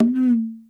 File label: Fast Conga Bend; Sonic Foundry (Sony Creative Software); Joe Vitale Latin Percussion